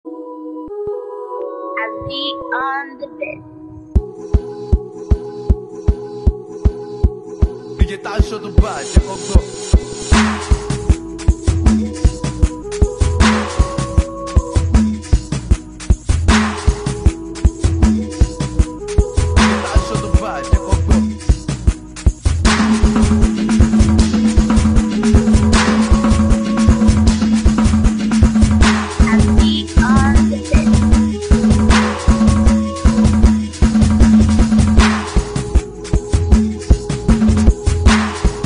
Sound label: free beat